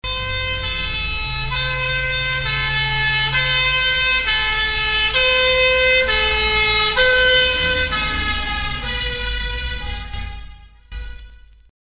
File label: european siren; Sound FX Real Life